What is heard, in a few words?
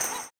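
tambourine, 160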